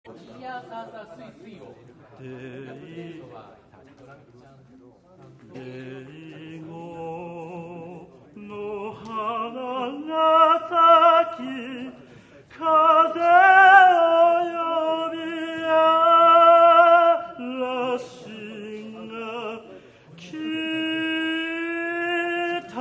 shimauta solo

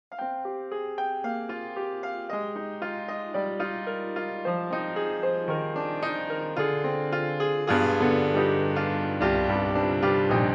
the big grand, piano; vir2

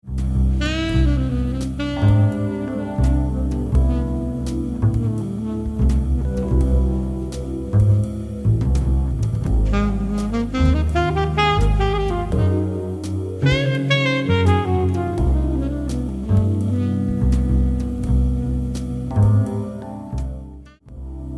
Song Alto; Theo GAIA HR6

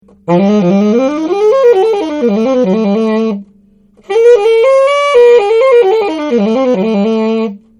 Bari Hawk 2

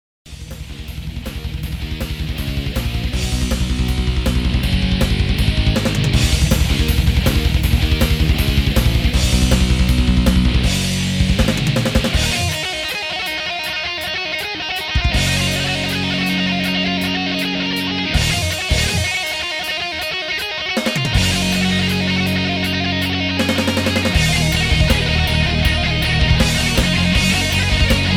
OUT solo

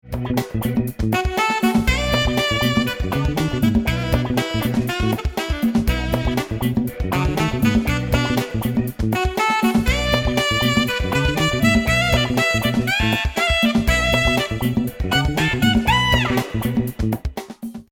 Funk Alto; Drake NYJA

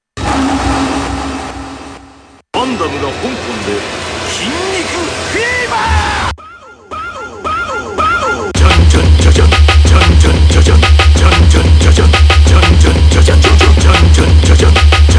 Drum n; VanDamme

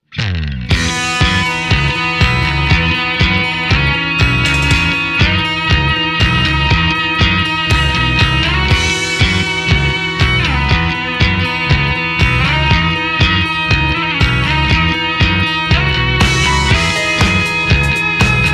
ebow

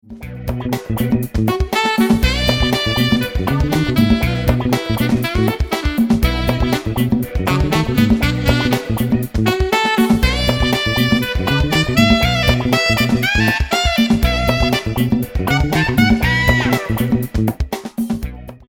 Funk Alto Saxz Silv

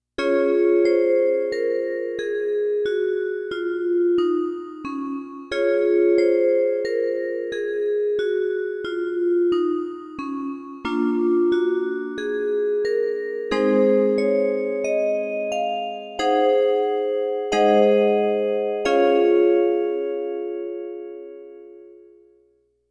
handbell

